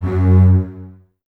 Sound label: Basses F#2, String Ensembles; Miroslav Vitous; LINKS ORCH